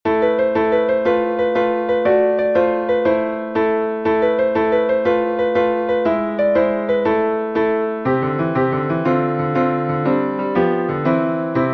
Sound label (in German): Tarantelbiss